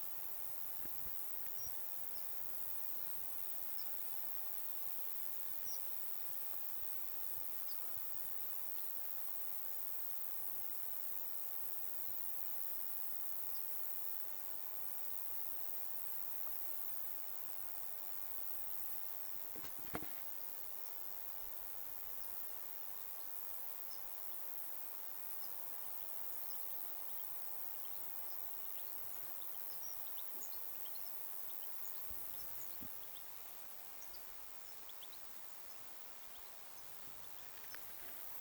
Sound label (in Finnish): rautiaisen ja tiklien tuollaisia pikkuaania rautiaisen aanet ovat selvempia ja kirkkaampia